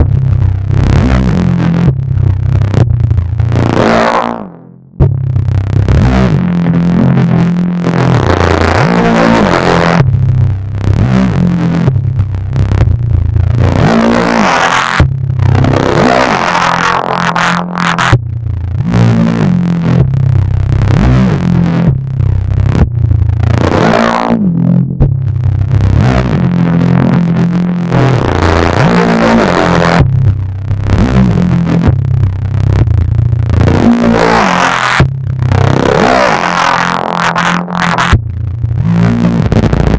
bass dirt

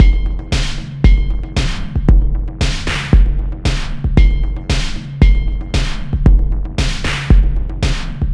quick ind beat

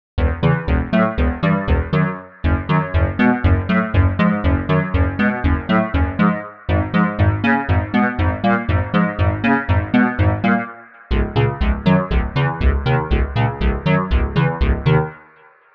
fm talking; the analog sound waves

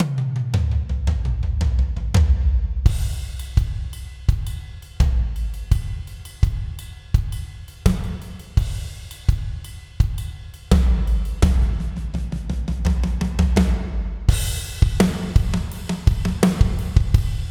gospel drum only; gospel drum kit ii; polarity audio

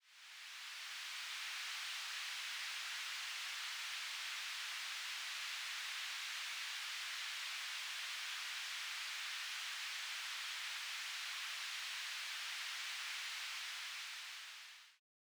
rain; noisepalette; sirenfx

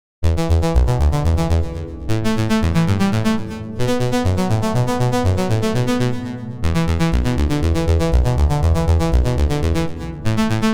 chromium arp; the space soundset

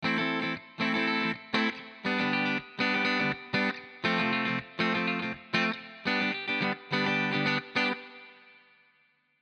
strato wet chor; Chiqui Audio; Chord Progression 32 120 Bpm A Min; Fender Stratocaster

